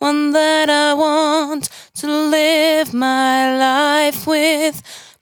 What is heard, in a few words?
92bpm Bb, Velvet Vocal; Zero-G